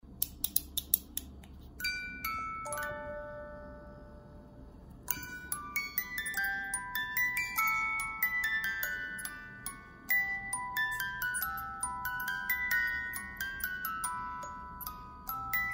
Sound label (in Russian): shkatulka melodiya